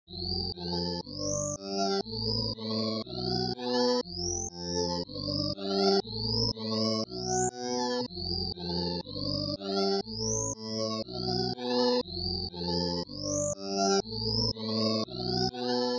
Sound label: Hammond